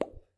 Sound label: plop, bubbles